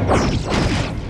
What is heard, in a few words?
Rifle Shots; trek